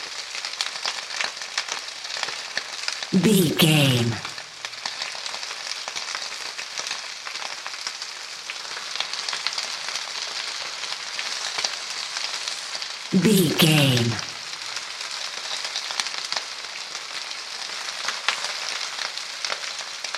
fire burning flames crackle loop

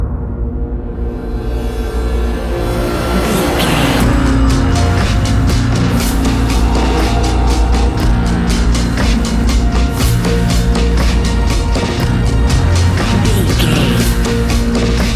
suspenseful nightfall

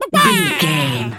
chicken cluck scream long

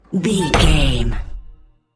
metal door hatch close slam

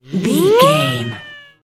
comedy siren whistle fun up

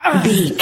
voice male effort action release

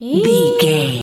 voice fun small character emote surprised

